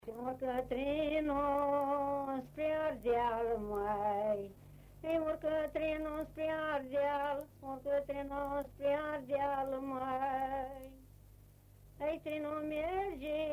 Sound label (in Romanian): Urca trenul spre Ardeal; Fonoteca Arhivei de Folclor Vasile Adascalitei - CJCPCT Vaslui